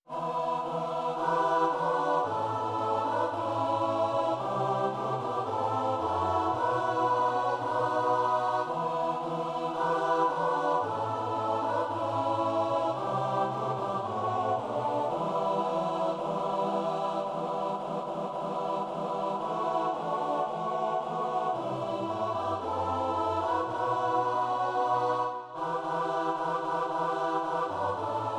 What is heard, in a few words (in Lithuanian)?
VISI Šventas ŠV AUGUSTINO MIŠiOS